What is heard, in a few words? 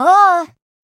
Grab; Teto; SM64 CO-OP; Triple Baka Pack